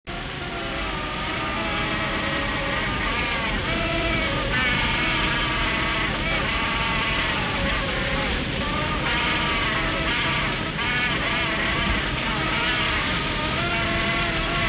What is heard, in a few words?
Hummer Bow Installation